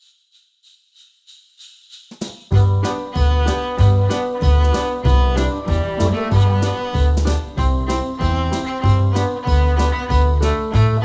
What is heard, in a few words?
christmas cello jingle bells